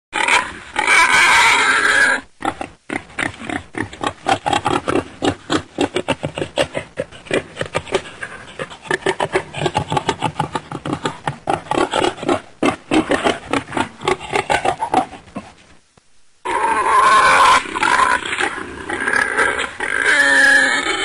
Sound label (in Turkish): Yaban Domuzu Sesi; Karisik Sesler